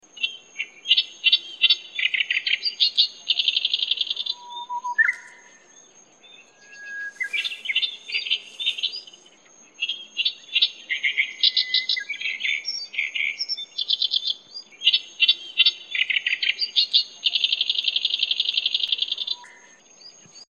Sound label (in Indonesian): kerak basi besar